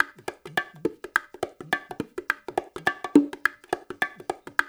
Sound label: loop, bongos; InVision Interactive; Percussion Slam